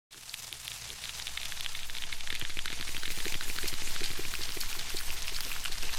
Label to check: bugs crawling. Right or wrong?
Right